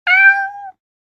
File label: Cat, Ringtones; Lumia Ringtones